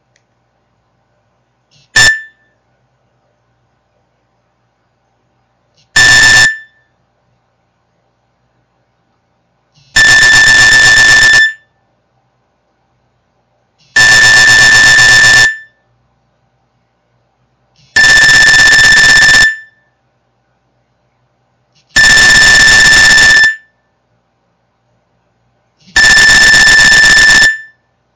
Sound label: Nokia Standard Alarm